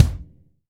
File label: Kick